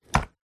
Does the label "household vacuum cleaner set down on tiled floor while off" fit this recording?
Yes